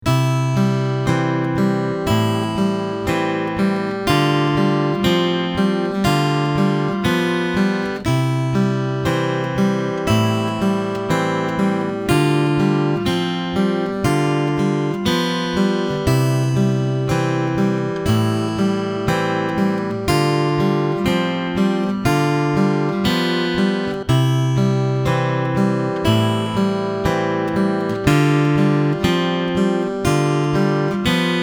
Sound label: Fingerstyle, acoustic guitar